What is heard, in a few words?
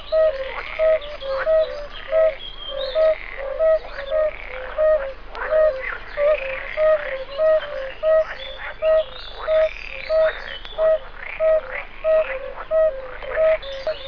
bombina variegata